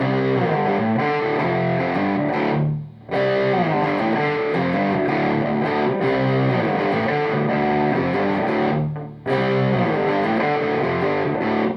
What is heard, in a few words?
strat with amp shallow; showmaster sss